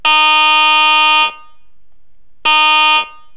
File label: rm horn, railbus; Goose #7; Galloping Geese and Railbuses